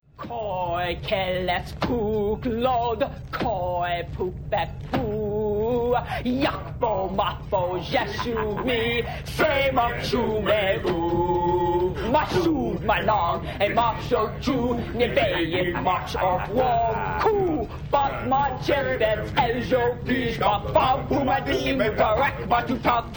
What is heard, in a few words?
Startup snd Klingon